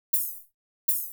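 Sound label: Hat Open Phaser; hydrogen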